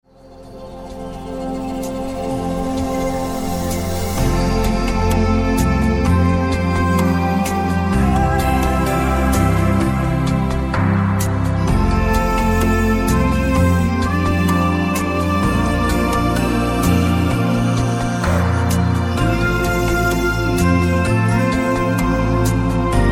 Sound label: Magical Jungle